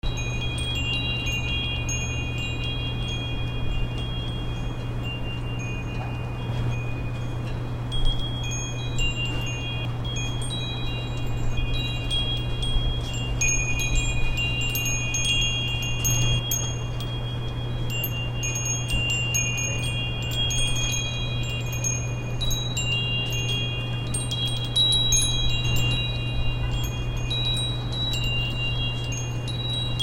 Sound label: atmo morro bay harbor chimes